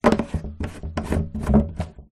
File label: industrial cannister large plastic lid on screw; zapsplat